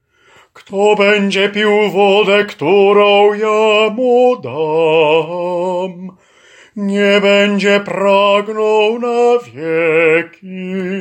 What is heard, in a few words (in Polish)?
Wody Jordanu SOPRAN